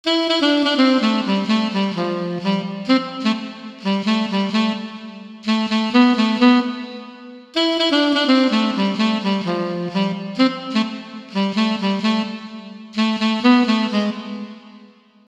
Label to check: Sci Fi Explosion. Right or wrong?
Wrong